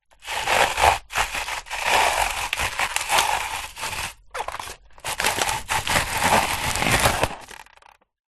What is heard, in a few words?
paper shredder manual handle turns shred paper; office